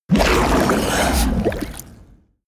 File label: kraken growl; kreaturen